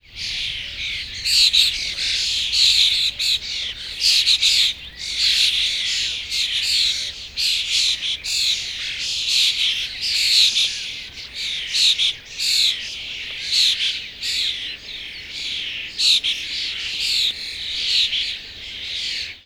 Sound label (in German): Star Gesang